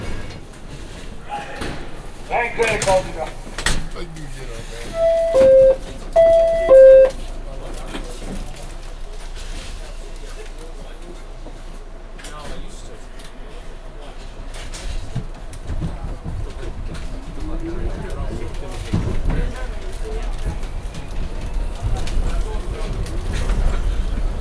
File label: Sound Effects: Inside Subway Car